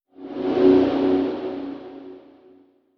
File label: Data,Reveal,Musical,Airy; Monster Jam Steel Titans